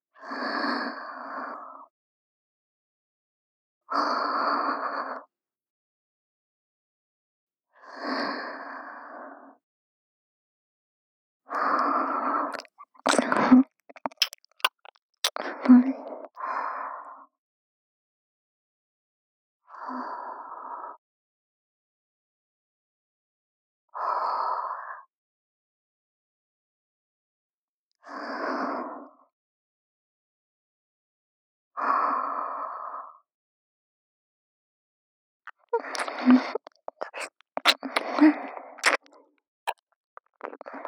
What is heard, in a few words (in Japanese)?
眠りながら左耳舐め; ループ; SEあり